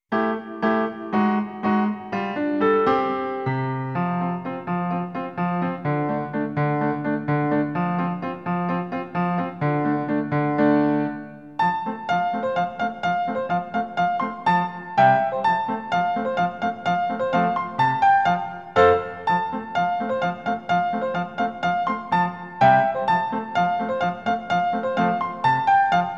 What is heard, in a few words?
Bonus Screen BGM; NINTENDO